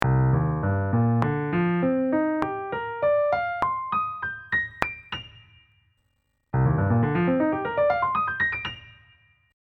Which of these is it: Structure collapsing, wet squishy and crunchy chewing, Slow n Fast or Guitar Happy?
Slow n Fast